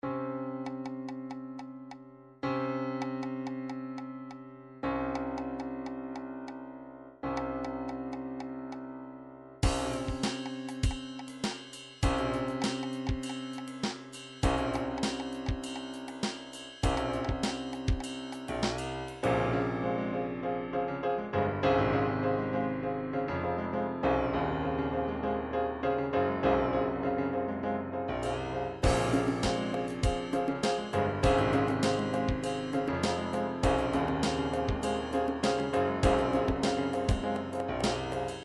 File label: triphop; Newtronic Triphop Construction Kit